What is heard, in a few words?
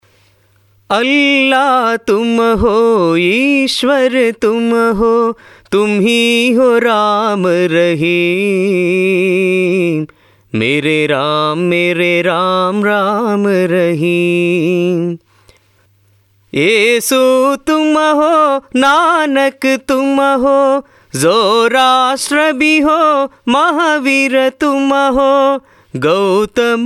Allah tumaho tune